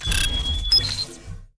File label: trainroof idle, monster